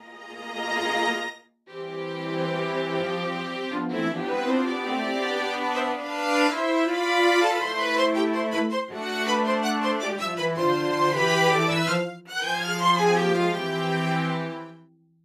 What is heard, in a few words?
Session Strings